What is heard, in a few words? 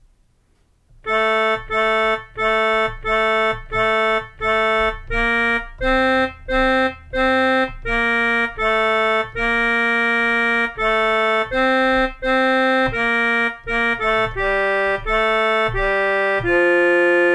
Alleluia soprano keyboard